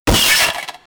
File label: to crash into the wall